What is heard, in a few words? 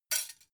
dough hook drop deep; industrie-wirtschaft; gastronomie